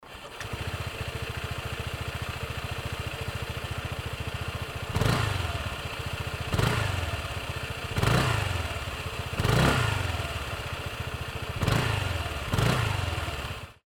pcx normal; jf81

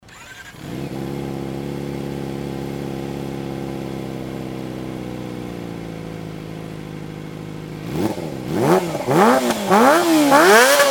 zx25r muffler silent; zx250e; beams

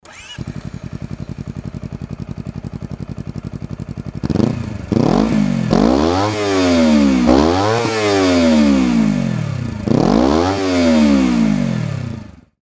cbr250rr

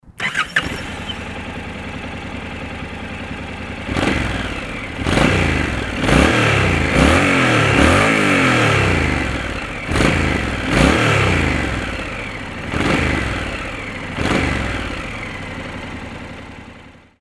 nc750s; lci-bf

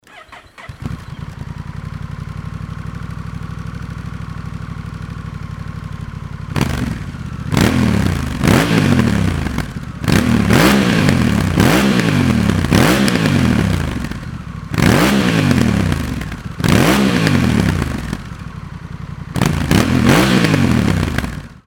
rebel1100 moriwaki; sc83

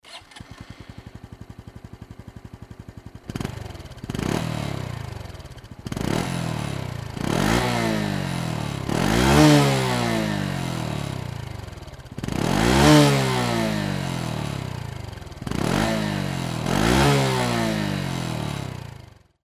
crosscub110 ja45 normal

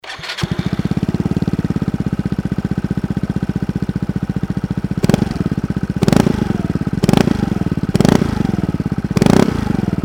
cygnus x; daytona; tawashi

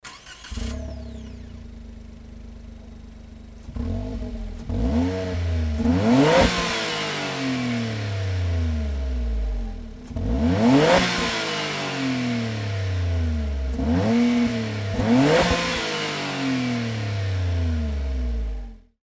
alto works ha36s

